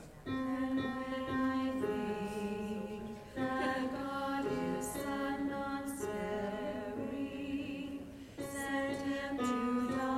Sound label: alto; Gather Hymnal